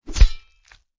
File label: sword swoosh